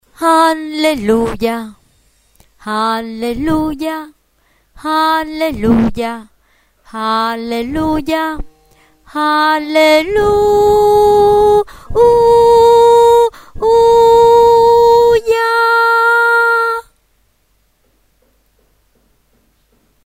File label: basso